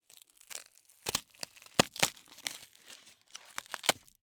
Cardboard, honeycomb, crush, rip, tear, irregular, staccato; Eiravaein Works; Vaeyan IV